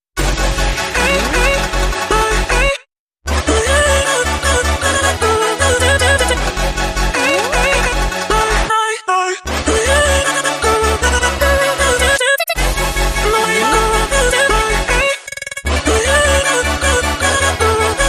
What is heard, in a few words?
No Drum